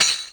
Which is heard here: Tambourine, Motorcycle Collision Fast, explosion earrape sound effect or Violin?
Tambourine